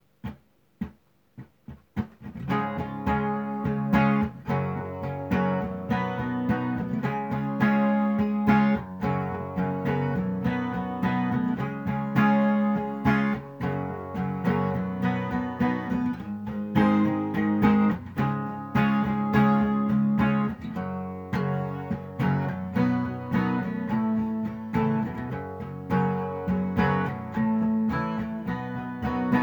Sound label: acoustic; popular embroidery design